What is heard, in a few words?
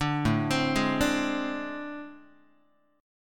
A Minor Major 7th Double Flat 5th A, Guitar; AmM7bb5 arpeggiated; 5,5,3,6,6,x; 4A,4E,5A,5D,5G#,6C#